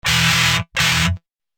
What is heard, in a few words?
echo